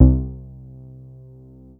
C3, BASS

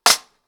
Snare Clack, drums singles; The Roots Drumloops

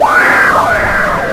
DISTO FX; Big Beat Samples & More; AKAI; DISTOFX KIT

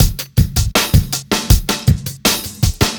Out Beat, Drum N Bass; Beats; Sonic Foundry (Sony Creative Software); Knutrix Drum & Bass

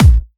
Trance Kicks, Bassdrums

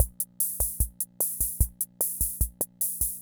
Kent K-220 Rhythm System Loop, drum machine; Kent K-220 Rhythm System Sample Pack; 300 Drum Machines

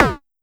Tom Lo Mid, Pollard Syndrum

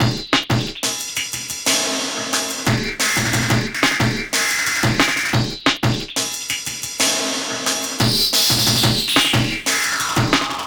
Killer Beat, Drum & Bass, Beats & Breaks; Fluid Dynamics

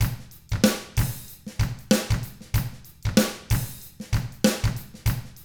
95 FUNK, Drums; ILIO; Double Platinum Drums 1

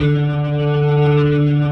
D, The Piano; InVision Interactive

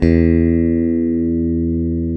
CLN STRAT, Synclavier, GUITARS; Sampler Library

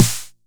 909 SD; Goldbaby Tape808 & Tape909; The Tape 909

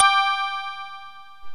PERC 5ths

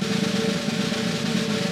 Vintage Snare Drum

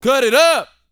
VOCAL F; ILIO

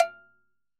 Timbaleta Cha Cha Cowbell; Best Service; Festa Latina VOL-1; Single-Hits

